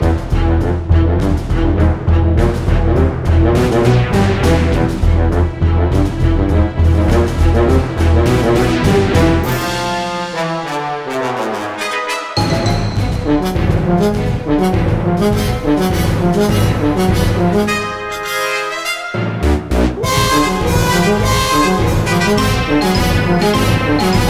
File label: Pickle Loop complete; Plants vs. Zombies Battle for Neighborville